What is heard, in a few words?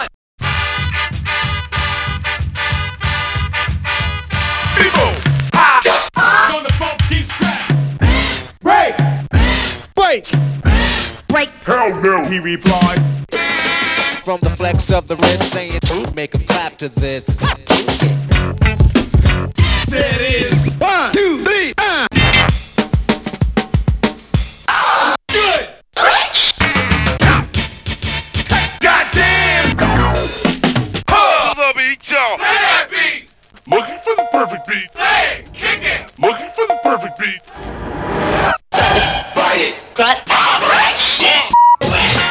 breaks; Super Duck Breaks